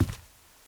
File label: carpet step, Footsteps; civ13; civ14